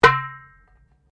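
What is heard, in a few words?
Drum Darabuka Edge mezzo forte, Egyptian Darabuka Drum; Commanly available Egyptian Darabuka Drum; Musical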